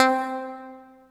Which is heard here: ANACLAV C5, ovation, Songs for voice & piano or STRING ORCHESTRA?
ANACLAV C5